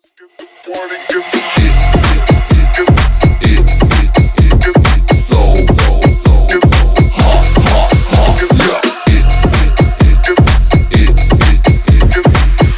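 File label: Bootleg Smashup 128 bpm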